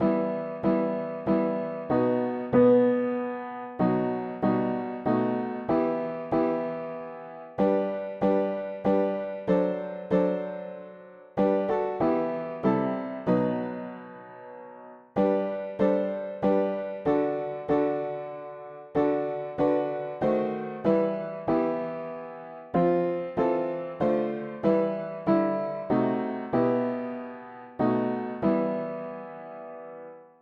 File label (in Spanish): mono piano; hymns; Himnario pequeno